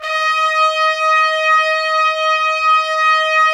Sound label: Trumpets; Roland - LA Composer vol. 4